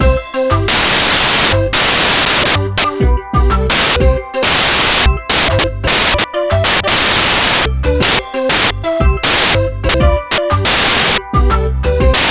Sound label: fantasy, bgm; pictcode